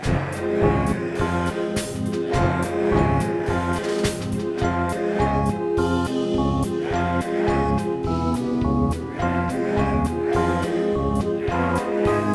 Zombie Carnival; Rush2 Zombie1

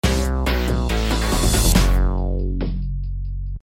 Level Lost; Awesome Tanks 2

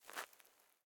Footsteps Snow Jump; Main Character

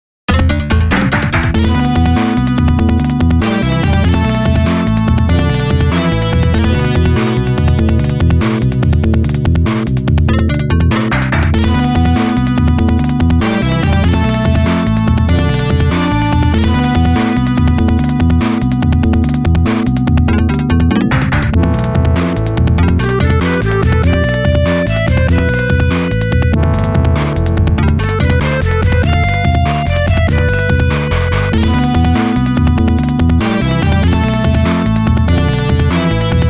Wind and Waves (STAGE 3); Irem Retro Game Music Collection 2

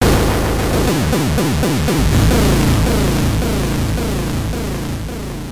Boss Dead; Xak -The Art of Visual Stage; X68000

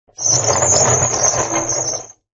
Wheel Sound

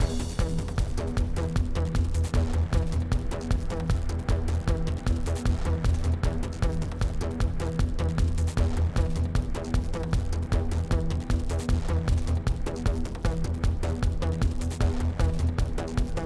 Gridnodes Combat 8CH 77BPM; Downtown

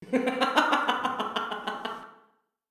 Hub Restaurant; Levels Hub Restaurant; Hub Restaurant Voice

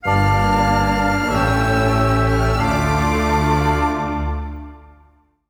Emporium mystery 94bpm Sting dramatic; jenny leclue detectiv

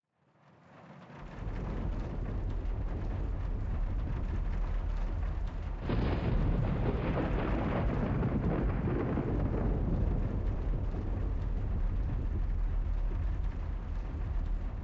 Ambient Inside Galleon; Star Fox Adventures

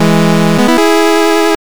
Victory Fanfare; ultra hyperball switch gamerip 2017

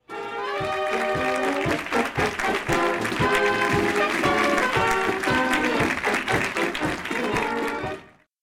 gui victory; Rescue 2013 Everyday Heroes

